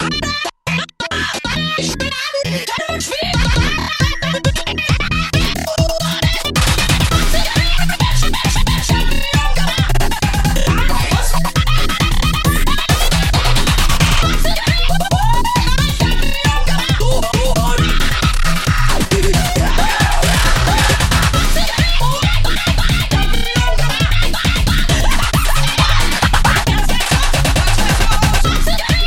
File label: Flat Keyboard; beat shobon